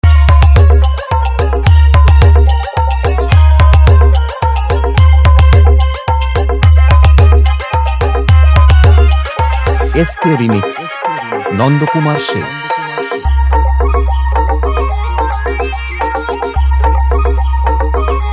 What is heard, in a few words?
Lion Bass Matal Dance Mix; Purulia